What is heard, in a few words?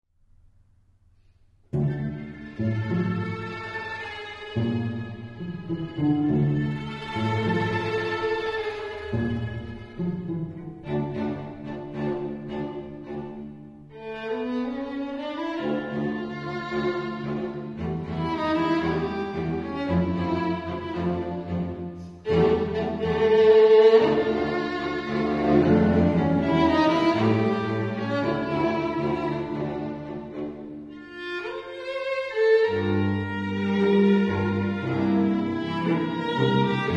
tango